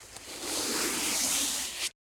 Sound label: Corn Leaf Movements; InMotionAudio; Sound of the Corn